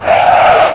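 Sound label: skid cement; Millennium Gold 2000; Monster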